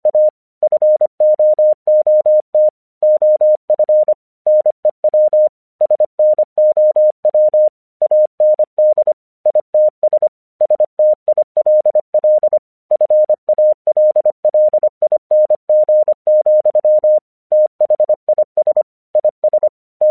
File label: English 25wpm